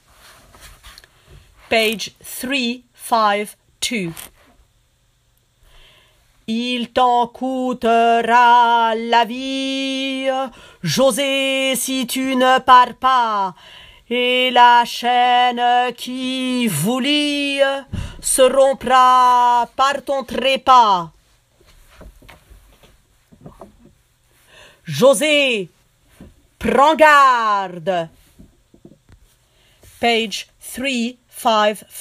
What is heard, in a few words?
tenor; Carmen